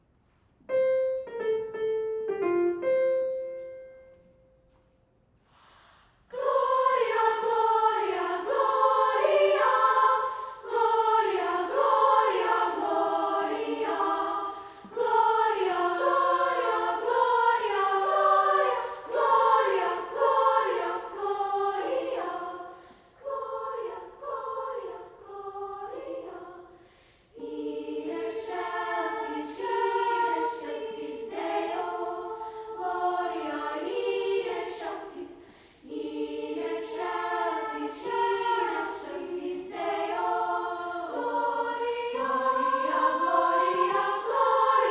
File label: treble; hill country ms